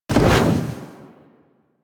Wall Latch Start; Warframe; Tenno

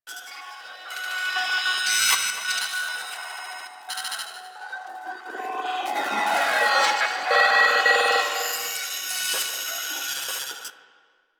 Sacrifice Vitruvian Device Loop; Warframe; Quests; Chamber

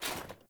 Foley Action Armour Run; Characters; Player; Deora Armour

